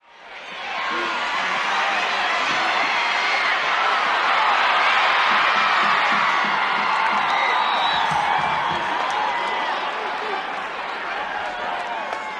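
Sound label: football crowd swell, cheers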